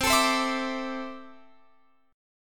C 6th Add 9th C down Guitar Open F; C6add9 strummed

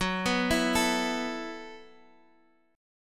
A Suspended 2nd F sharp, chord; Asus2/F# arpeggiated

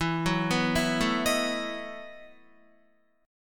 E Major 7th Suspended 2nd Suspended 4th E, Guitar; arpeggiated; 4F,4A,5C,5G,5C,6E; JGuitar

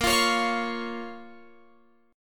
A sharp Minor, down, Guitar, Open F; strummed

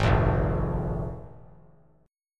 F Minor Double Flat 5th; Fmbb5 strummed; JGuitar

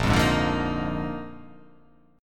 A sharp 11th

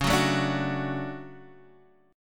D Major C sharp, guitar

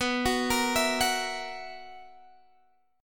B Major 7th Suspended 4th B, Guitar, Standard; BM7sus4; arpeggiated; x,14,16,15,17,14; down; capo=9

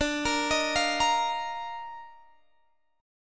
Eb Major 7th Suspended 2nd D

